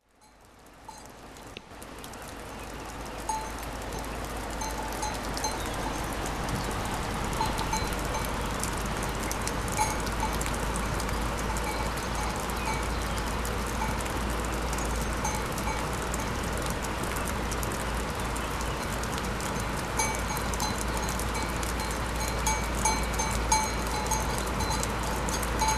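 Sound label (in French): Cloche du belier sous la pluie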